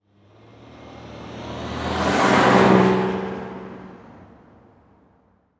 Truck Ford Ranger Drive By Fast; transportation

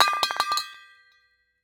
Impact Canister Metal Drop On Cement; Impacts

